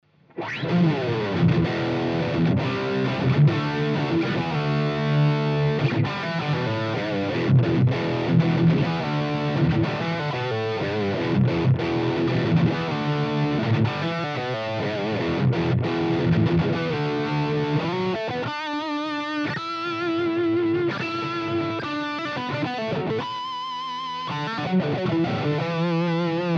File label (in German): HighGain Riffing Steg PU